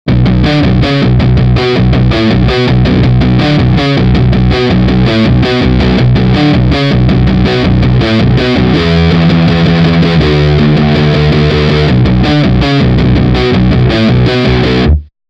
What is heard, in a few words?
COYOTE Stoner; TEYE